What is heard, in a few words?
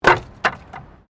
Wood Fall